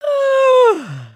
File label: sleep start, emotes